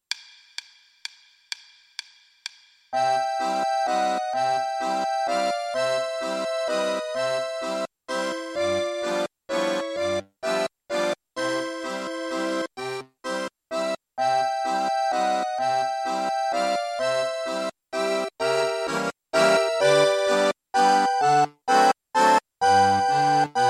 valzer 6 8 2 voci

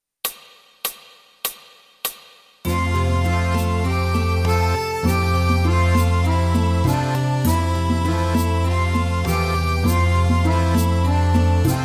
Fisa tutti

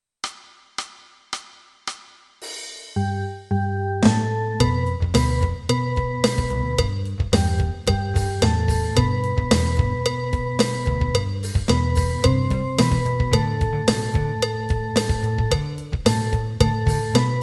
Organo

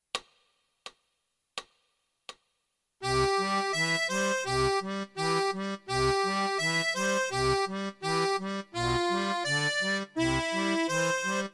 arpeggio

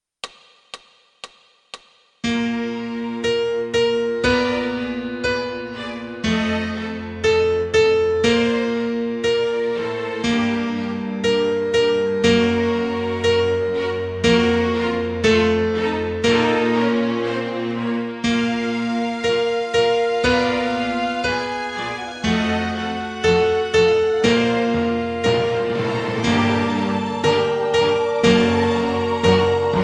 Marcia triste, Piano

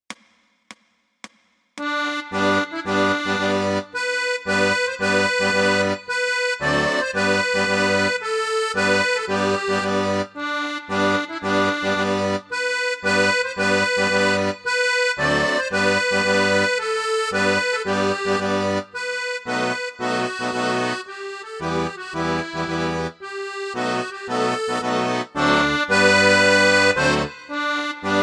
Inno Italia, Fisa

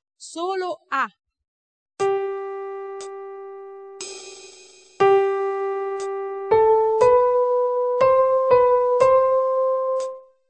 ritmo 2/4 solo

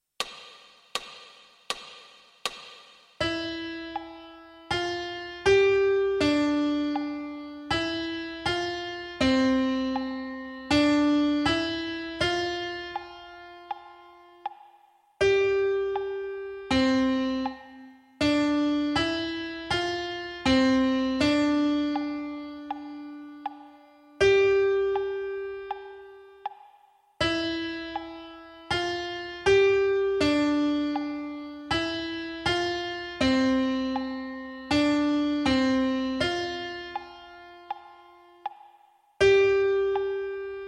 Piano

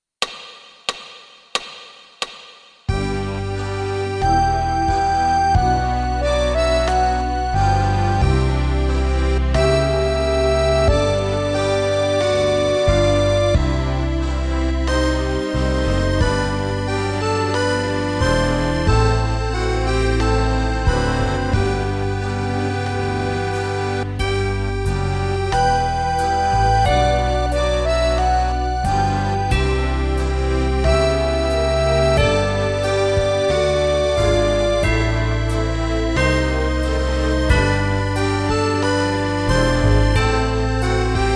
Fisa tutti

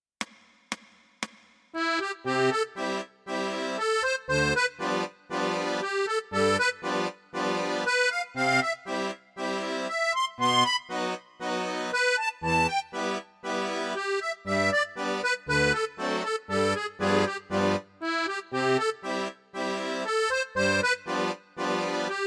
Danza delle ore, Fisarmonica

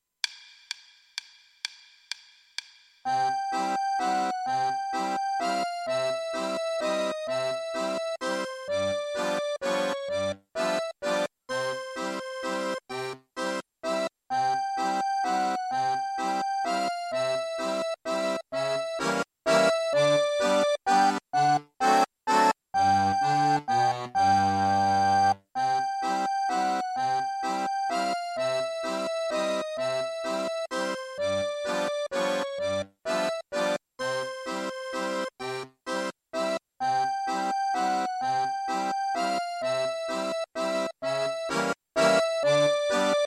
valzer 6 8 1 voce

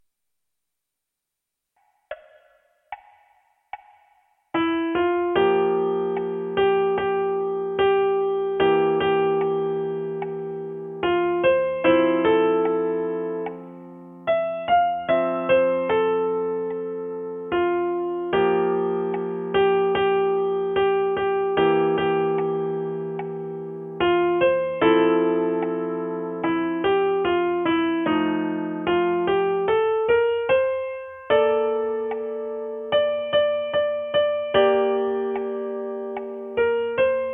piano

